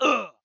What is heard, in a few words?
Human Dead